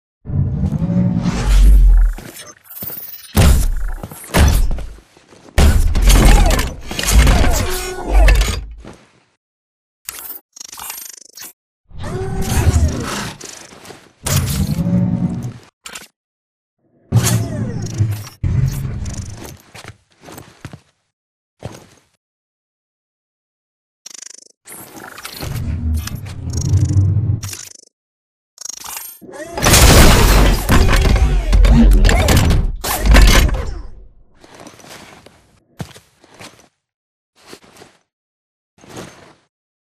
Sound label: cavalry arrives; Titanfall 2